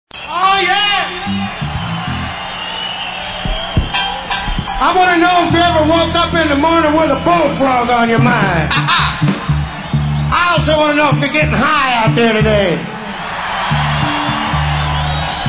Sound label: live, Blues Rock